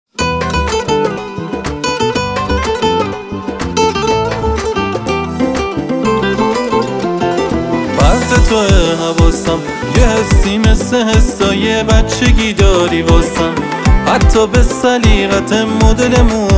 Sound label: mix sax